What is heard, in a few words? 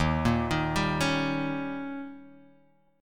D sharp 7th D sharp, Guitar Open G